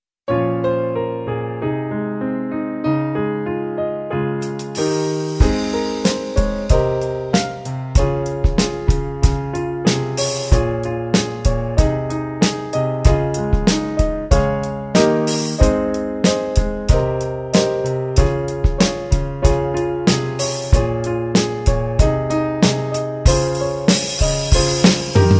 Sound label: Eb Horn, Tuba